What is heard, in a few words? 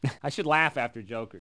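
laugh joke; bloopers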